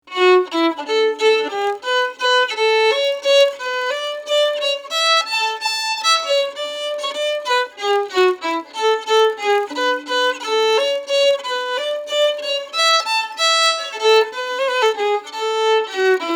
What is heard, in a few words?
Waltz in A